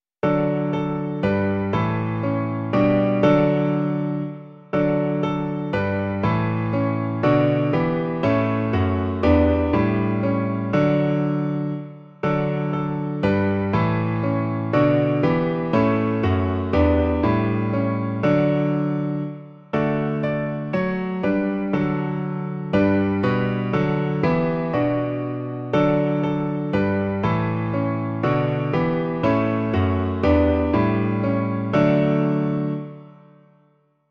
klavír; Aby nás Pán Bůh miloval; Klavírní verze

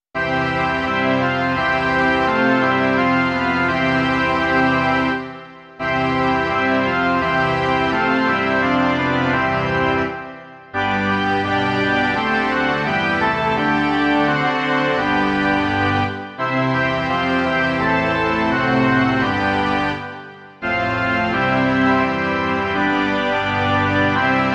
varhany a smyčcový orchestr; Ejhle, Hospodin přijde; Imaginativní verze

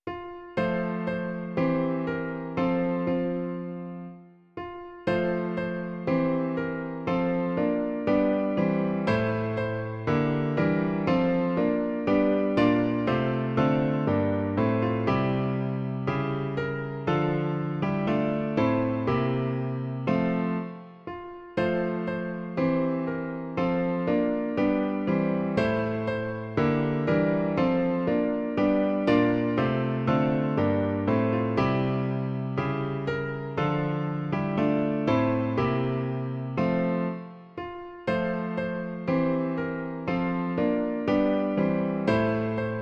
Kdo spatřil jasný hvězdný třpyt - klavír; Klavírní verze

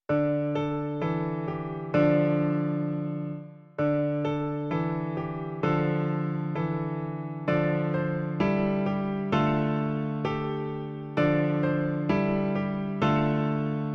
Pomoz mi, můj Pane - klavír; Klavírní verze

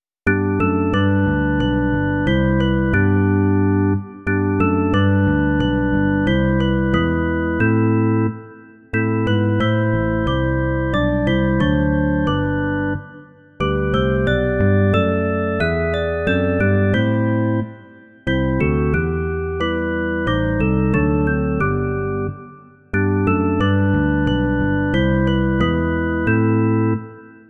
perkusivní piano a baskytara; Sotva země v jitra zdobě; Imaginativní verze

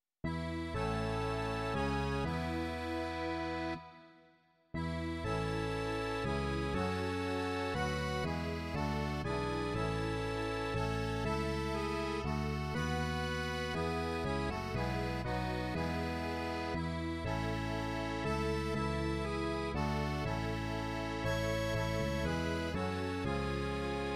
akordeon a baskytara; Aj, stojím u dveří; Imaginativní verze

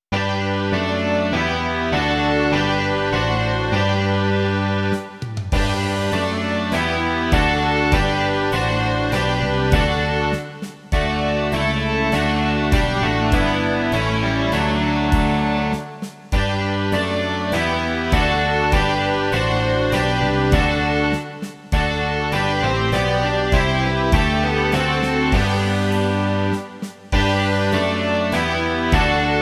rock se smyčcovým orchestrem; Kriste Králi, Dárci spásy; Imaginativní verze

